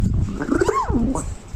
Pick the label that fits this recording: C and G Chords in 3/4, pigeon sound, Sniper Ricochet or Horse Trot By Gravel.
pigeon sound